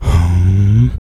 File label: vox, Human Perc